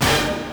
Orchestra Hit, Yamaha DD-7

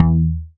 BAS MKS-50, Roland

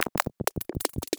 loop, techno atmos

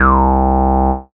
bass C, Acid Bass, Dance Synths

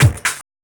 COMBI, Houseworx; Ueberschall; Workz-9

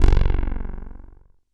JUNO BASS

